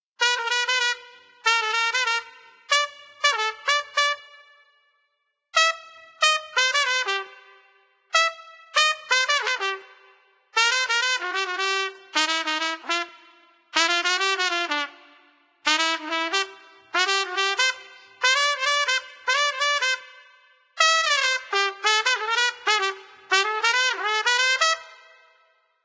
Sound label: Trumpet Duet; When The Saints Go Marching In